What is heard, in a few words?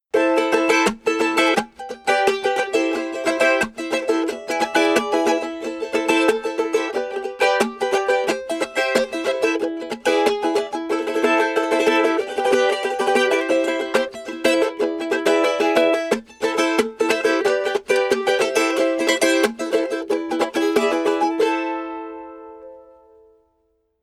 charango